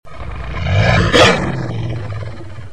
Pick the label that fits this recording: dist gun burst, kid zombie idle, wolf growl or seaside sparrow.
wolf growl